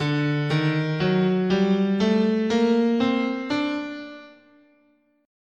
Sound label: D Phrygian Dominant, scale